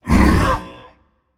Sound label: breath, voicepack; smite; jorm